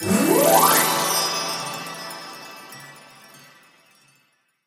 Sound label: Valentines Magictrigger